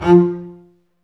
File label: STR, Cb Bowed, Cb Marcato; Roland L-CD702